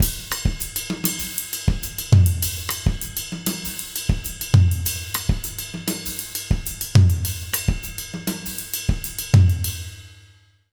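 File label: 100 Mozambique, jazz latin drumloops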